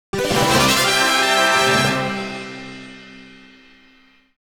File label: Victory!; nintendo switch; pokémon scarlet violet the hidden treasure of area zero; super music collection; Wild Pokémon